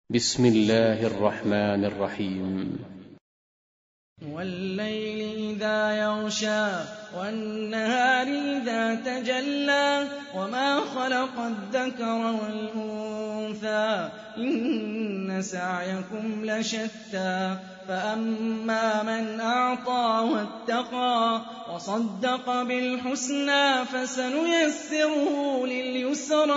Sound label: Quran; Hani Refaey